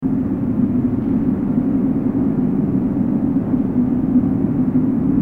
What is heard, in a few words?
ambient fire wind